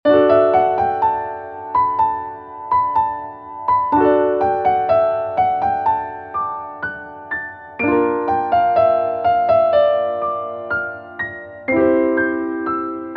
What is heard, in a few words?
piano; さわやか 綺麗